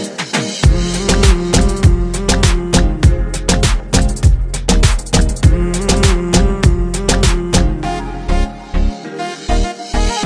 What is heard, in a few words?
Instrumental Music Ringtone